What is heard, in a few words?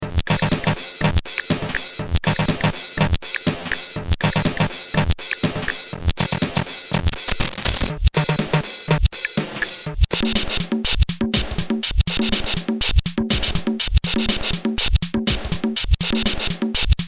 xr10, drum